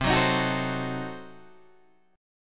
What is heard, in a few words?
C Diminished 7th C down Guitar Open G